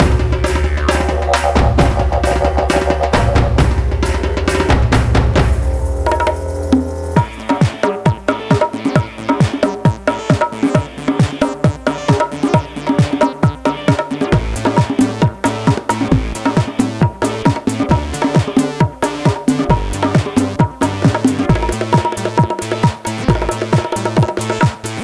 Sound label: Tribal Boucle; Imagine Modern Dancer; Nintendo DS